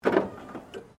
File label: CAR MAZDA RX7 SERIES3 EXTERIOR LIGHTS UP; Car Mazda Rx7 1983 Series3 Exterior Lights Up; Cars (Sound Effects:Vehicles)